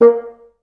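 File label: staccato A2, Advanced Orchestra; Best Service; Peter Siedlaczek's Advanced Orchestra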